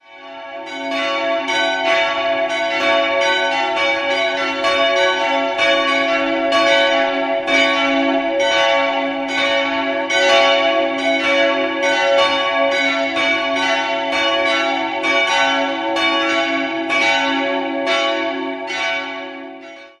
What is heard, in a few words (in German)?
glocken; Beratzhausen ev